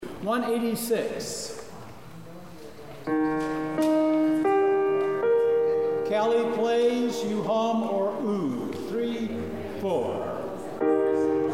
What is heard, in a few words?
Verdi learning Piano notes voiced; rehearsal files